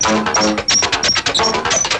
machines; Stanley's Sticker Stories (1997)(Edmark)

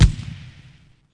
Terr shot; Sierra On-Line; SWAT2DEMO

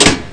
Switch; MacAddict

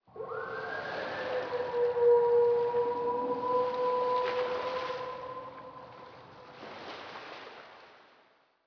water space, Ambient; Zeus

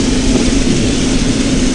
river, surfaces

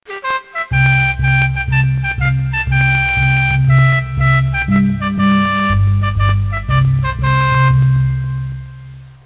Anthem

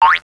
Bounce; Princess and the Pea; SoftKey

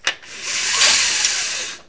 venetian, open clos; KABOOM! - Wild and Wacky Sound Software; house

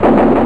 gun hits; BOMBER III™ (DEMO)

